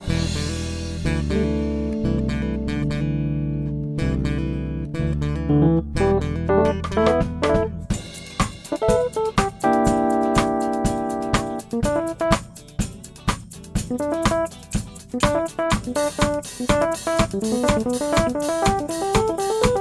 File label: unmixed, funk; mix-mastering